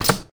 Menu Click

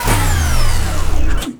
Scifi Power Down Mech Arm; Energy & Forcefields

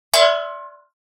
Metal Pan Ping Hit; Hollywood Edge; sound designer's toolkit v2

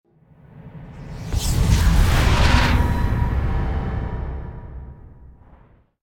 Whoosh Into Multi Fire Impacts Distant Heavy; Hollywood Edge; sound designer's toolkit v2

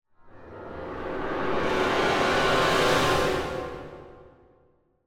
Symphonic Hit Troubled Calls; Hollywood Edge; sound designer's toolkit v2

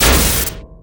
Scifi Shield Hit; Energy & Forcefields

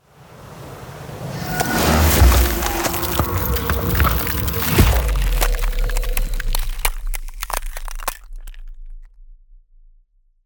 Ice Spell Transformation Giant Growth; Arctic Aura

